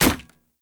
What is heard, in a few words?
Polearm Medium Impact Wood Hollow Large; Weapon Impacts; Player; Environment; Sphere Polearm